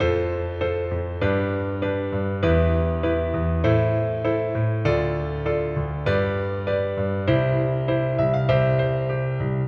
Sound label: piano 7th